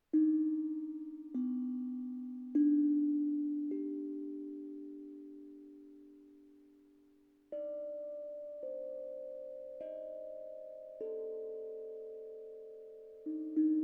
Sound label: hapyy drum